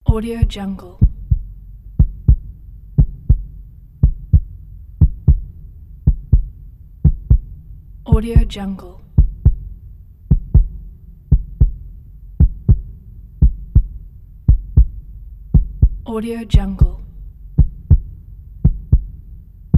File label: heart beat